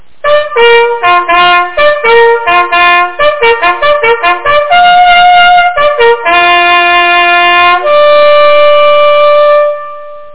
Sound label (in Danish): raeven, jagthorn